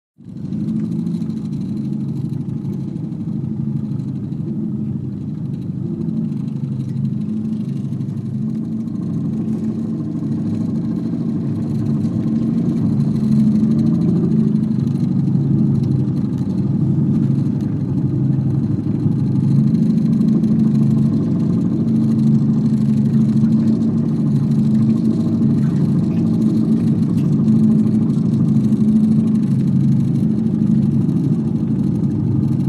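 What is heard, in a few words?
Outboard Motor Int Idle, motorboats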